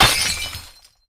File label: glass break